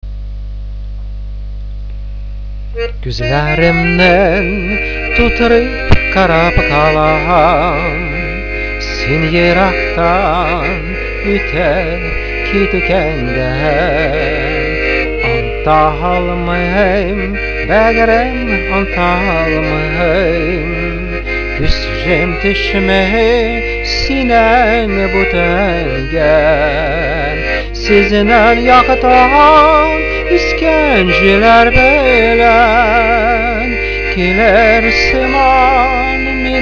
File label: vocal